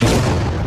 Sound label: Explosion; Space-Smash-Out; SoundManager Demo